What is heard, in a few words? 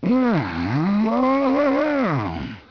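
male angry; People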